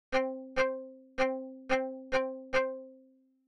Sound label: Inharmonicity